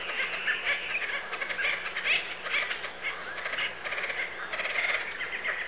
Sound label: birds; borg